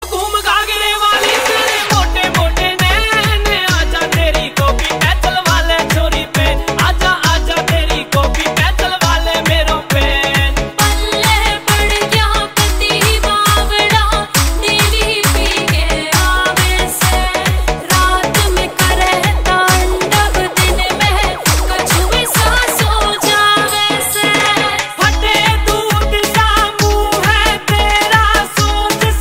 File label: Ringtone